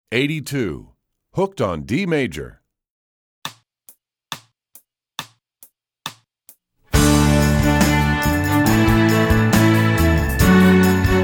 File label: D Major, Strings; Hooked On D Major; EE2000 Book2